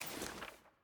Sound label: ui record next click; jinx